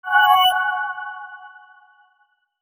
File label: reward